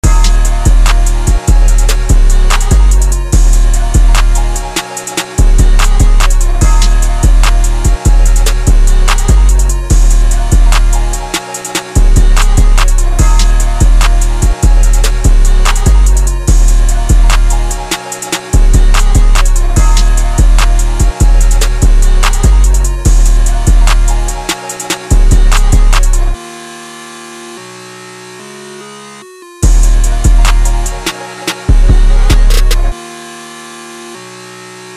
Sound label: Instrumental